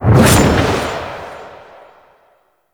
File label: Hover Attack Base; Enemies; Warframe; Warframes; Pax Duviricus